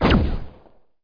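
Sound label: heavy bolt; PC Gamer IT CD 41; machines demo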